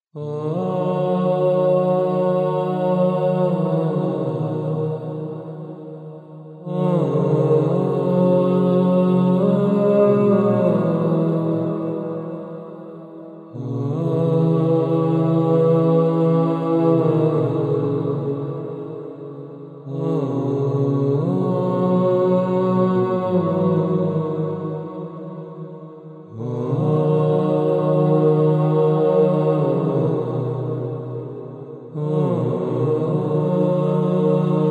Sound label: Vocal Ehsasi